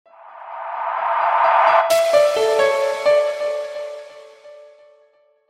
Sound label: samsung sms